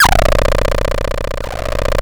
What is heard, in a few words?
C1, synth; 90 s Sample CDs; NiceBeats; SynthLine VOL-29